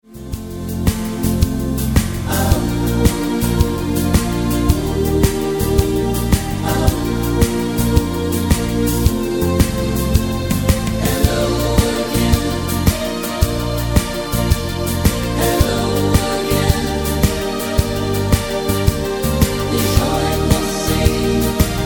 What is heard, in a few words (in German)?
mit Chor